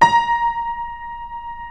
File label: A#4 R, Keyboards Acoustic; USB Soundscan; AKAI